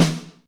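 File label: GATE SNARE; Crypton - DrumBase5000; GATE KITS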